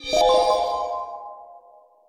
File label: match